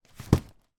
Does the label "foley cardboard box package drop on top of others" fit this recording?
Yes